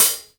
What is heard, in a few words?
hihat, Drum Kit